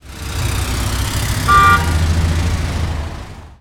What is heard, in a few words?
HORN, City Ambience; SFX